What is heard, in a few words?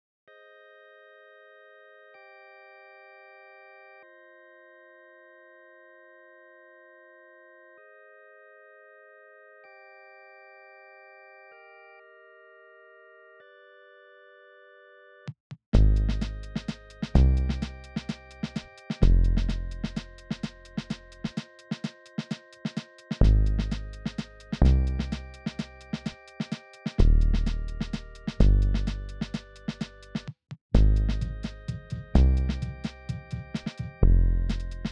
128bpm